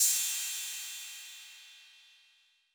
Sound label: Cym B 808 Decay B